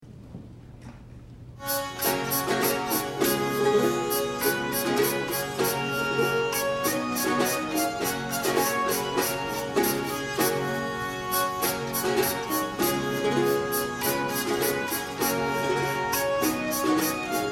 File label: Musette